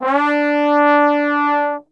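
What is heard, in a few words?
D 3 trombone glissando, Brass Super Section; Best Service; TRMB GLISS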